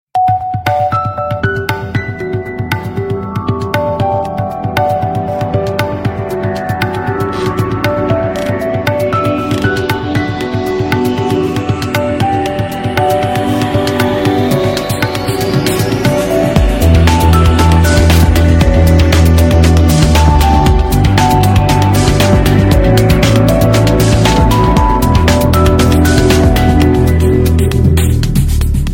NOKIA SUNDOWN RINGTONE

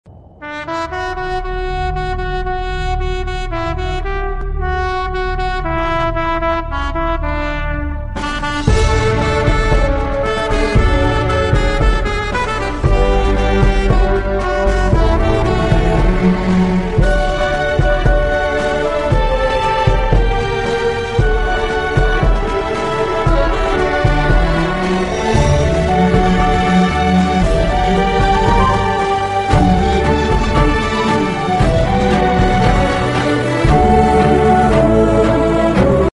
INSTRUMENTAL; INDEPENDENCE DAY

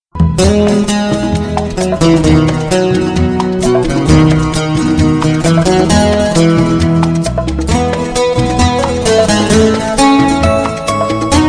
INSTRUMENTAL RINGTONE

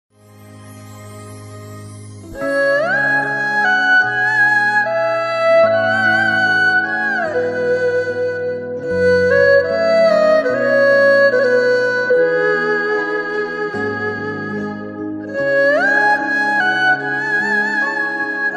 LOVE FAILURE FLUTE BGM RINGTONE

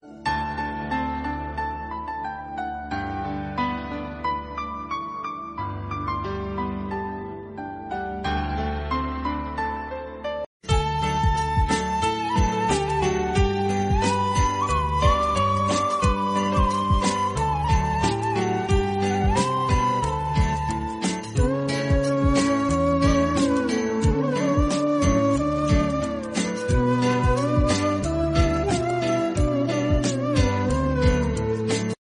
INSTRUMENTAL RINGTONE

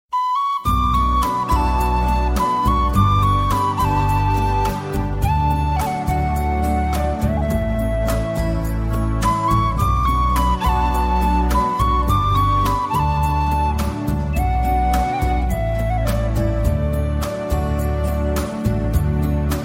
SOFT FLUTE MUSIC RINGTONE

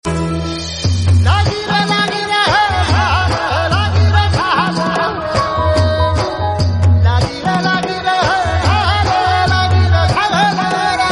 Marathi song ringtone

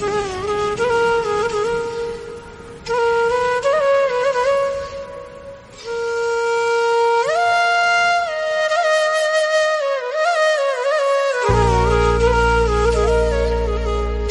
FLUTE RINGTONE

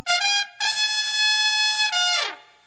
MARDI GRAS HORN RINGTONE

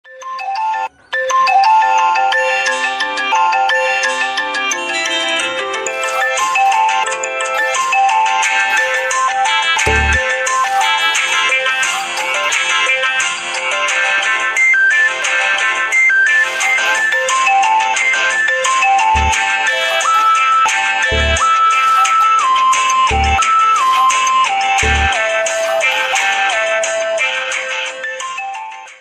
VIVO S1 PRO RINGTONE

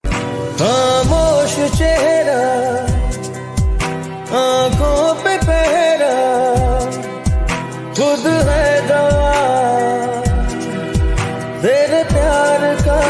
HINDI SONG RINGTONE; MURDER 3

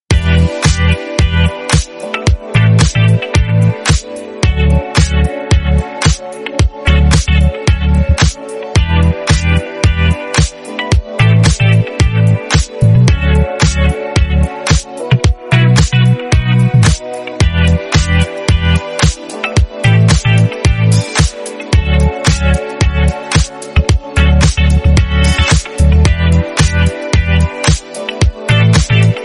instrumental ringtone

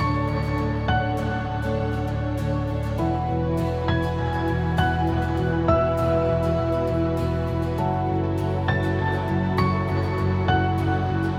VIVO T1X RINGTONE

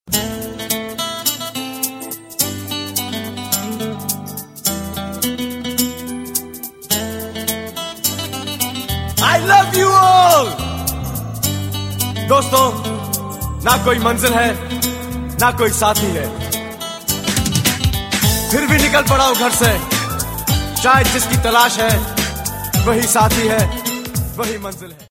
INSTRUMENTAL RINGTONE

